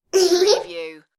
Toddler Giggles

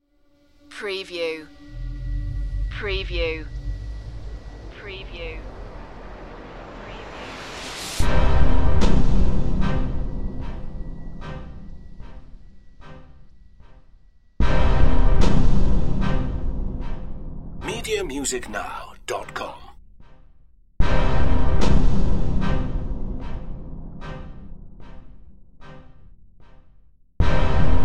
middle section only

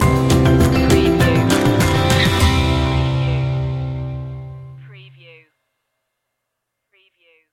walk together stinger

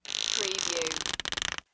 Squeak Creak Stress

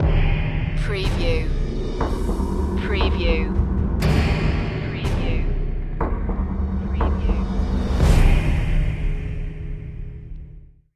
stinger

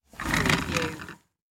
Chair Leather Roll

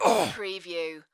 Male Grunt, Human Injury, Pain, Vocal Ugh, Ouch

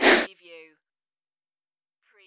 hover

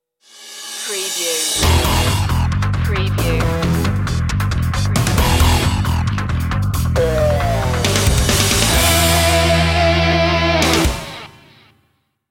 willing to dare stinger

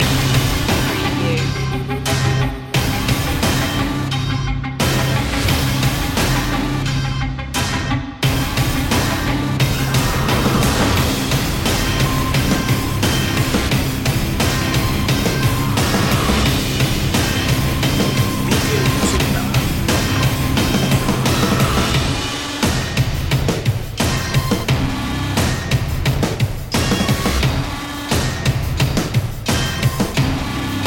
radiation loop

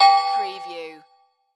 Multimedia Error

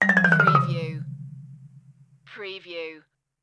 Music Xylophone

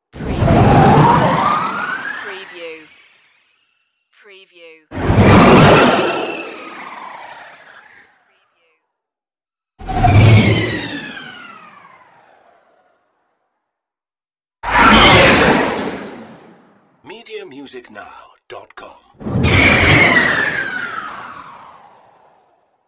futuristic scooter passing

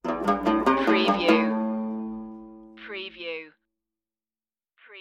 banjo beat stinger

